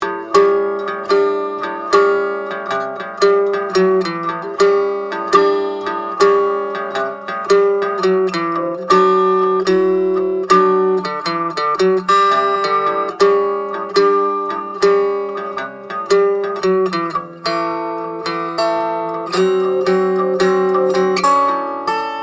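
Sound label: Soundhole, guitars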